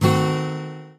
Guitar Acoustic Failstate; Instruments; Zomboid; Lifestyle

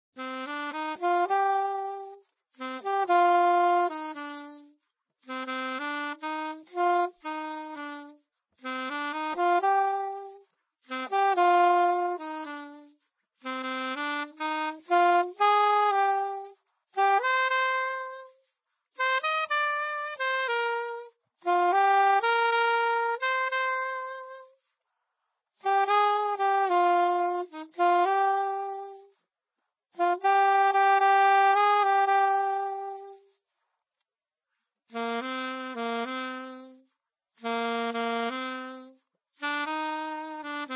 Saxophone; Zomboid; Lifestyle

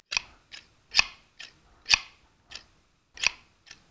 Pump Upgrade; Zomboid; P4PumpPury

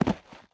Body Falling; Zomboid; Lifestyle

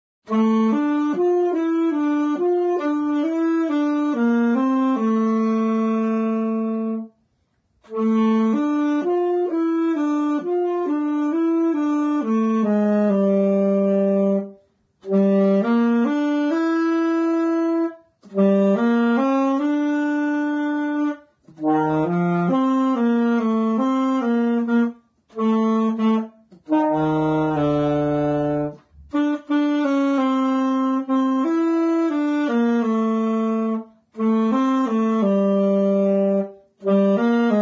Saxophone; The Godfather

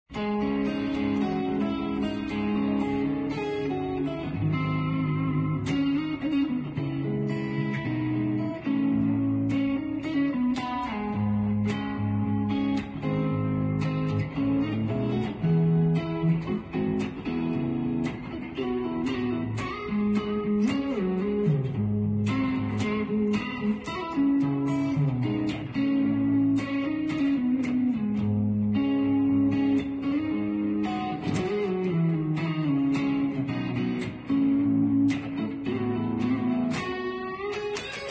Guitar Electric; Zomboid; Lifestyle

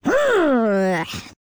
Woman Frustrated; voice; Zomboid; Lifestyle

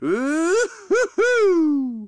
Man Woohoo; voice; Zomboid; Lifestyle

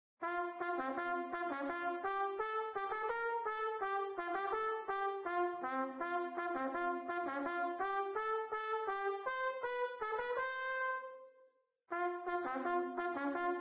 Trumpet; Zomboid; Lifestyle

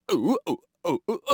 Man Dance Happy; Zomboid; Lifestyle

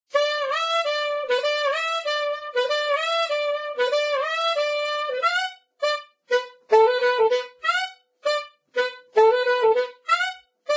Saxophone; Zomboid; Lifestyle

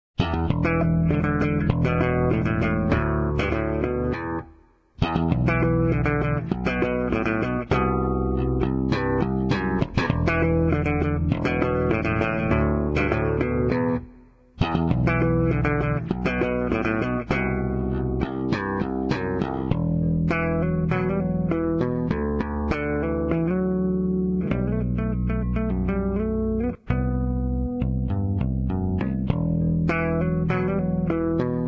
Guitar Electric Bass; Zomboid; Lifestyle

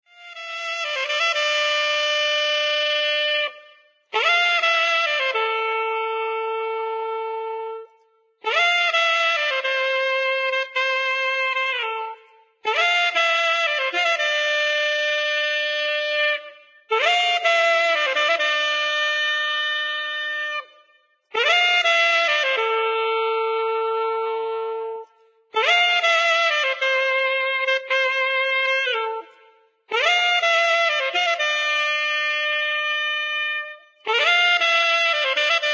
Saxophone; Zomboid; Lifestyle

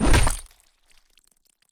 Chain Bat Hit; Scrap Weapons; Zomboid

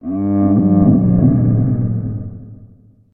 moan; Zomboid; Random Sound Events; Stalker

